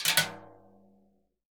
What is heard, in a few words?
CASCARA SH, Synclavier Percussion; ILIO; Synclavier Percussion 2; World & Orchestral